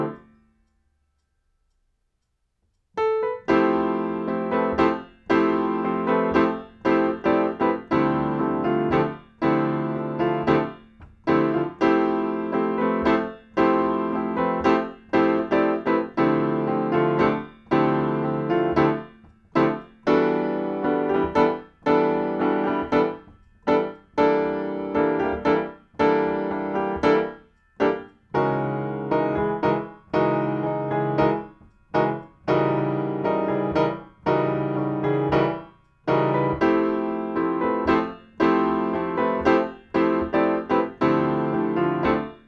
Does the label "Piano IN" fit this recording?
Yes